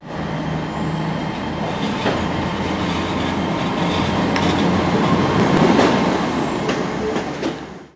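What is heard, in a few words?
class 800 diesel, train; Simutrans; Pak128.Britain-Ex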